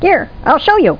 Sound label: 3 Scale Down; How Many Bugs in a Box